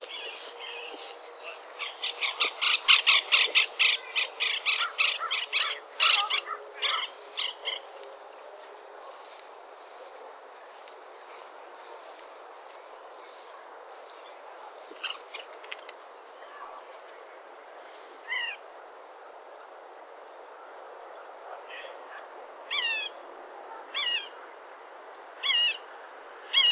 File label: Red-naped Sapsucker, Sphyrapicus nuchalis; woodpeckers